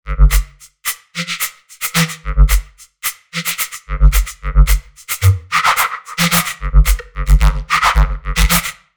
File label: Drums Unique